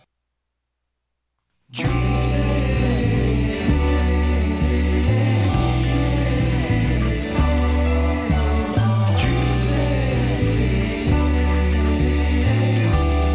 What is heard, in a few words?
jingle